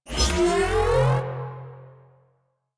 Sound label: Alt Charge, Blastor Pistol; Warframe; Weapons; Corpus; Plinx